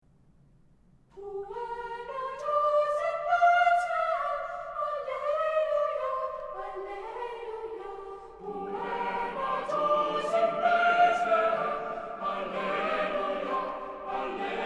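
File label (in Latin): Puer Natus in Bethlehem, basse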